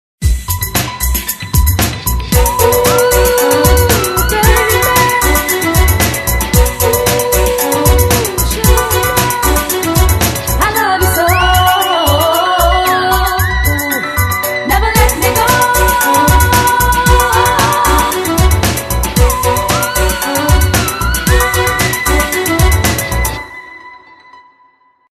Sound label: ringtones; Condor; C8; TorchDance